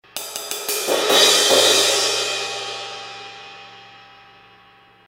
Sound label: Uptown Ride Pattern